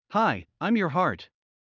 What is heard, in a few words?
Heart fast; Classroom humanbody